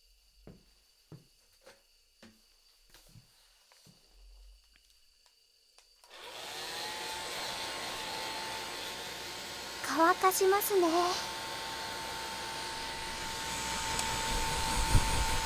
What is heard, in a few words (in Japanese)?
ドライヤー; 新装開店バーバーすずな